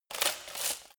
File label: light walk fast single